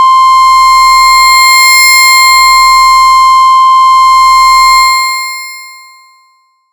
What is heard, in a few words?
SY HUM; ModelCycles